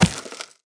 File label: dig clay; Immortal